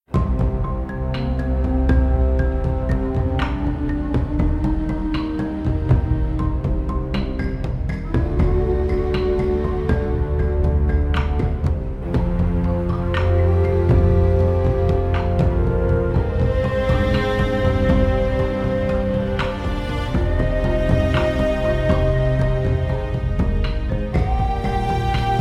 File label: music ambient